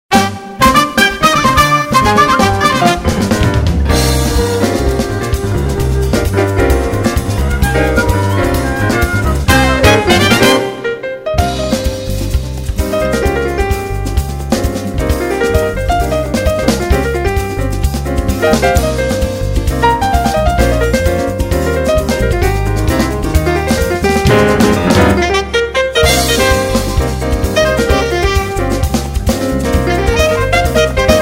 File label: Samba